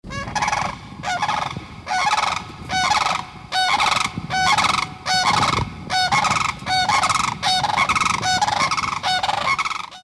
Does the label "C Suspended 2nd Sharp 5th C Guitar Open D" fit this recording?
No